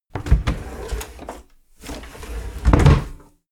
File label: drawer open close